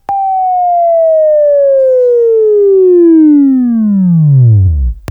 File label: Buzz; Labview Program; Sweeping Training program